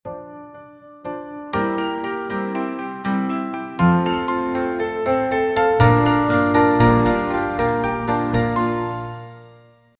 wedding music on a spring

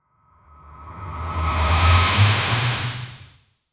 create a transition swoos, jet

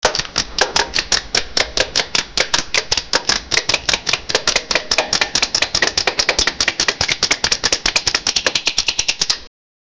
temple sound

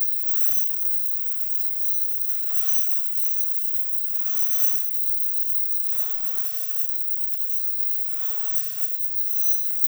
a creature thats actually, non aggressive

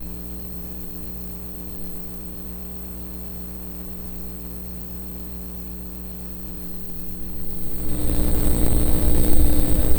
digital notification beep

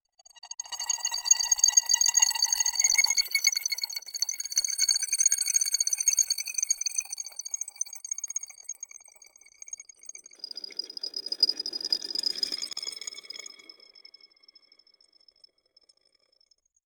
star dust magic particle, particles

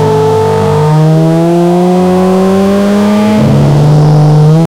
formula 1 car accelerating

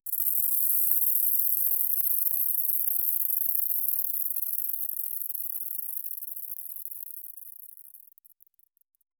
sound effect of rapidly, glittering

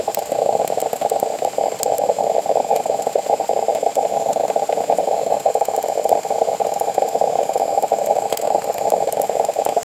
the sound, coffee machine

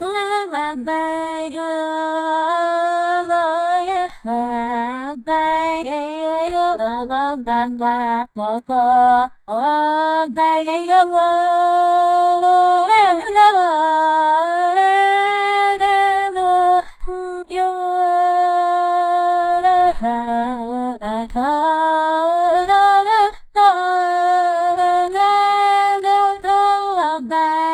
africa trible voice wom, african

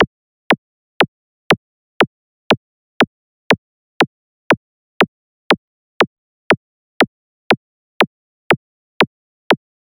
retro future interface, future

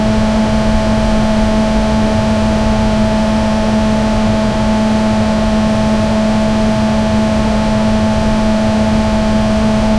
white noise of fan; household

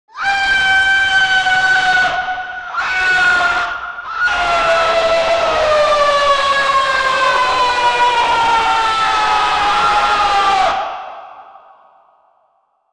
there are sounds of war, conflict

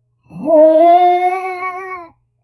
camel grunt with light de, desert

